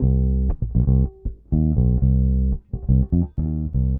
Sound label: Bass, 70s Analog Funk